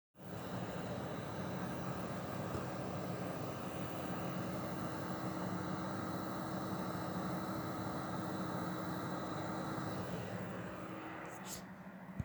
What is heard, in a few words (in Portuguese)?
Som sem disco